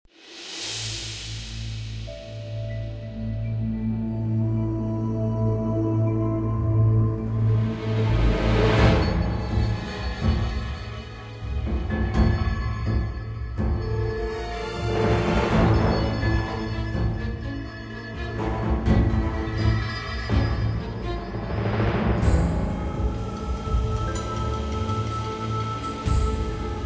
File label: Timeline BGM; AFK Journey; Android